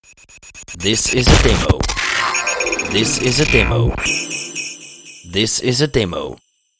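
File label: Static jingle riser; Xmas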